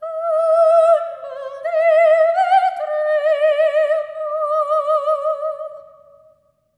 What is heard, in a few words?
SOP. TRAD, Symphony of Voices; Spectrasonics